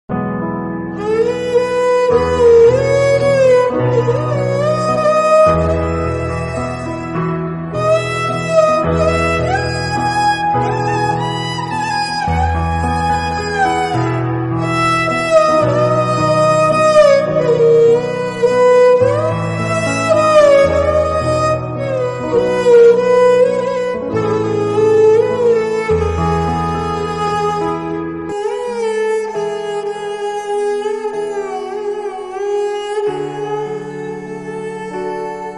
instrumental esraj rabindra sangeet music ringtone; rabindranth; belaseshe